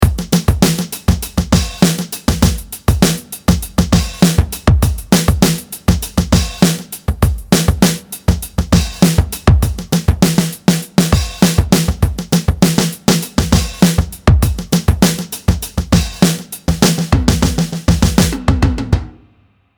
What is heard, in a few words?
tight classic break